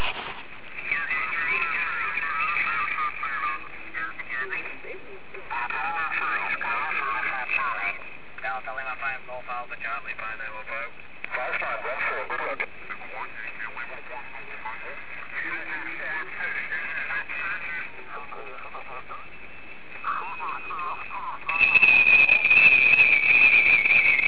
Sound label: splatter; CQWWSSB2015